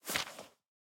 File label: log click